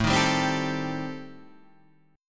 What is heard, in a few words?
C Minor 6th A